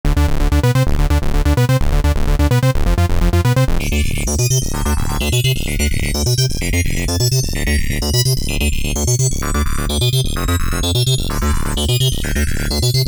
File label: Trance Synth; Molecule